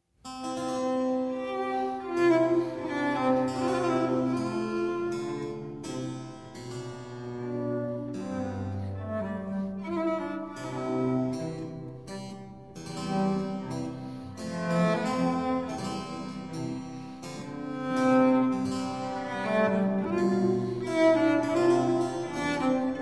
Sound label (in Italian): Sonata In Do maggiore, Andante, Violoncello e Basso Continuo; Op5 No3; F Gemniani; Sinfonie and Sonate del settecento italiano per Violoncello e Basso Continuo